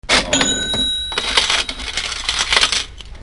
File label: register; Machines